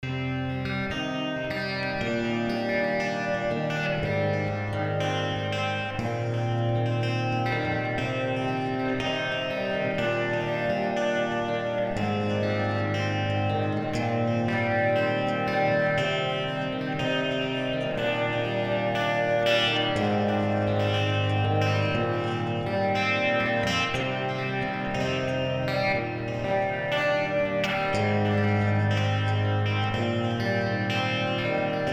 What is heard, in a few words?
Electric Guitar Delay